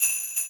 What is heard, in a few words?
Chm Echo long